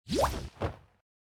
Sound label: army birth; Epic Toon FX